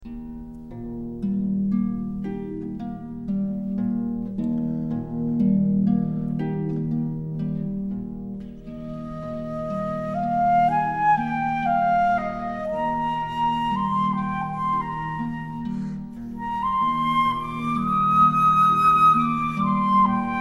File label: flute harp